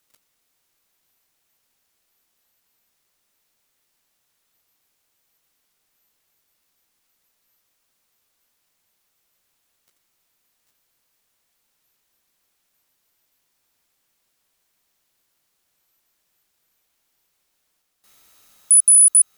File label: 28040kHz RF; SK6OSO; SM7FWZ